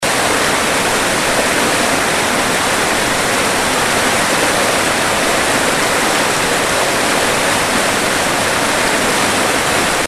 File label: Roxbury Falls